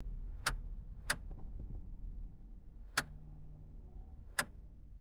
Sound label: interior car turn signal clicking; vehicles